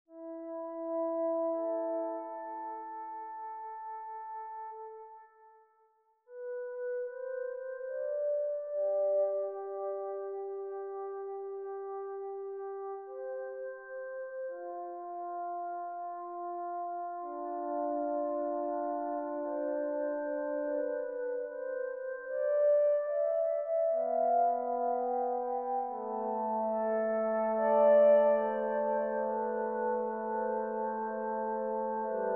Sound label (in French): flute seule